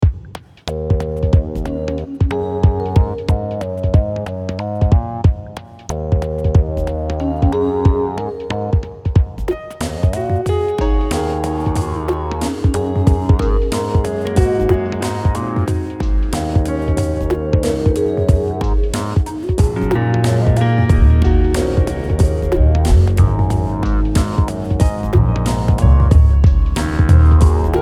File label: jazz; Sonar